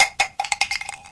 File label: shotgun shell, tink; weapons